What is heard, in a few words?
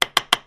Knife Chops; utensils